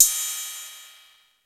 Cymbal, Roland TR-606; Drum Samples

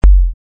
kick

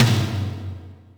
Yamaha RM 50 Toms

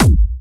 Medium, kicks

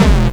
bombo, kicks, Hardcore

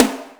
snaredrum, Yamaha RM 50

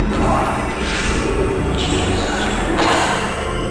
Dark Atmospheric; Sound FX

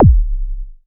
Roland SH-09 Bassdrum

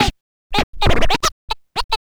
Scratch

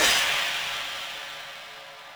CYMBAL, Yamaha RM 50

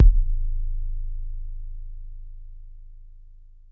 kicks, Bass Drum

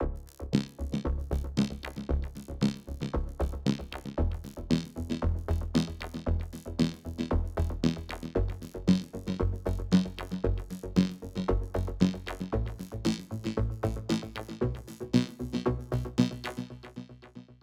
Voc Electro Loop

